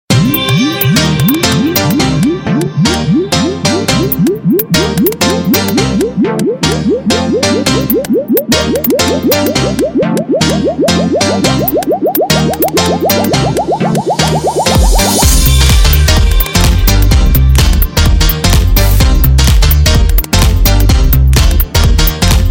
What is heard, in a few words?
cool electronica, ringtones